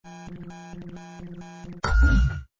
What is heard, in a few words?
Computer Test